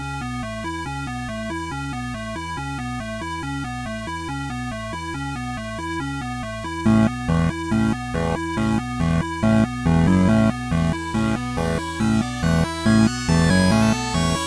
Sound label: March